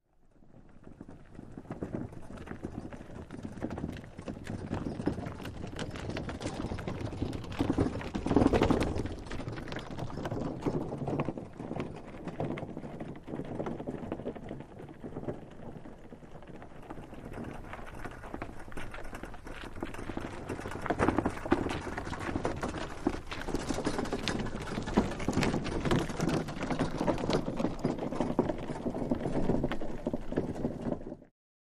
Mule Cart Walking, carts

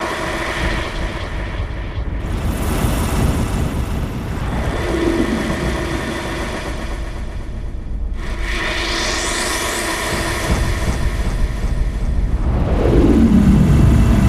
Liquid Morph Deep Pulsi

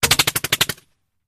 Bullet Impacts Metal

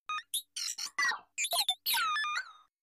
Confused Beeps Carto, beeps & tones

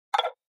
Button Hollow Wooden

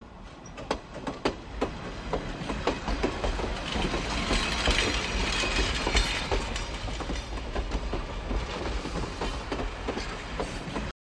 Diesel Train Wheels, rails & rumbles

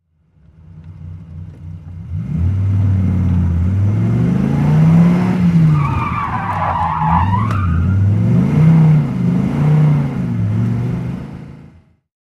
Idling Accelerate, sports car

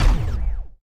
SHOCK RIFLE BALL FIRE; rifles

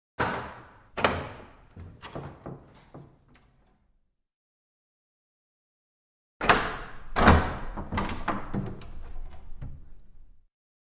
Large Wooden Window

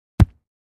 Ground Hit Sledge Ham; rocks & dirt

